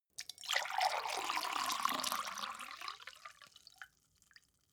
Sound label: glass pour hard; berufe; gastronomie; soundpool